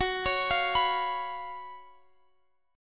F sharp Major 7th Suspended 4th F sharp, Guitar Standard; arpeggiated; capo 9; chordshape; x,x,16,18,18,19